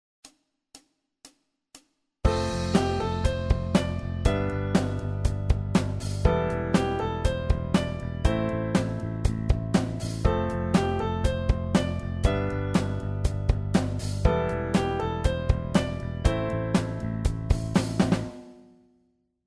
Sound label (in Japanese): ベース ルート